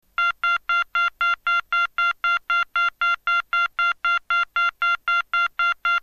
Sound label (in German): ton, Signalgeraete